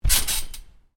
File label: Trampoline, bounce on: light bounce on canvas trampoline; Miscellaneous Sport Sound Effects; Stuart Duffield